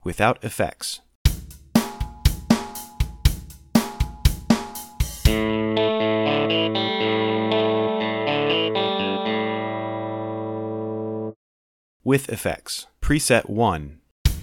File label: reverb; pro studio; Mixcraft; acoustica